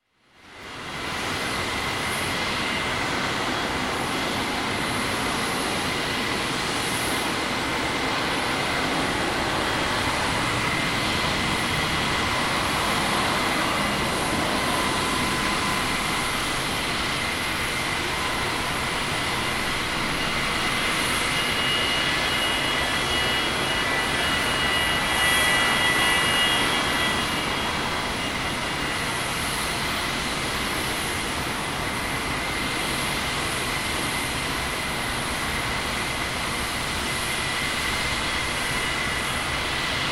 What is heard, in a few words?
Airport Tarmac Ambience; Sound Ideas; Series 2000